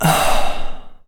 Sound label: Male Voice Sigh Sighing